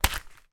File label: foley paper calendar hand on nail in wall